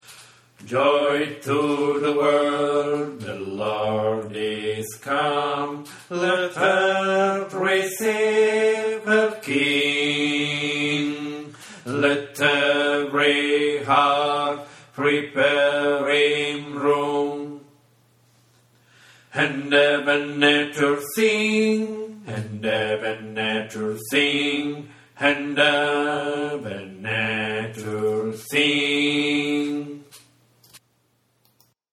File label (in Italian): bassi; Joy to the world